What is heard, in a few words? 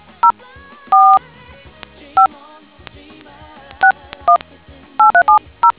dtmf